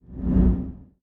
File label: Whoosh Low Fast; Whooshes